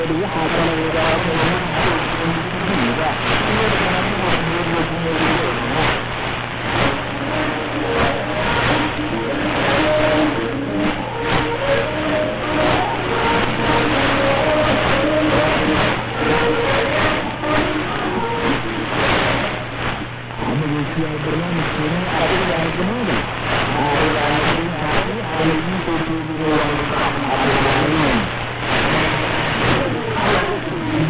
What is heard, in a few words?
Radio Xoriyo, 17630kHz close